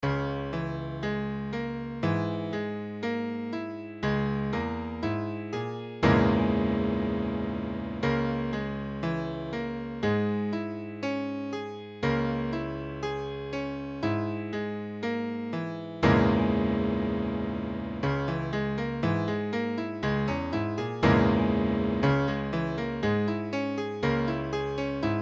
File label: Dbm6, piano